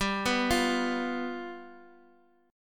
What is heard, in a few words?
G 7th G down Guitar Open D